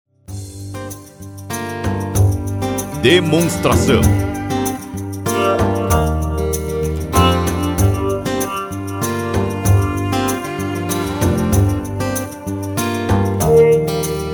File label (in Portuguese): Trilha